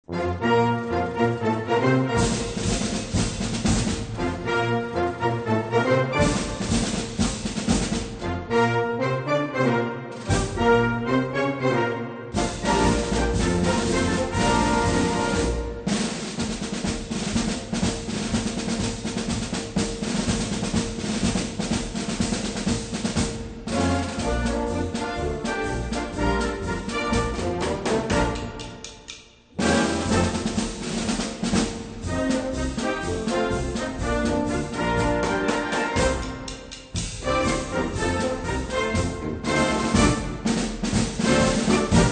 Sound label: drumsticks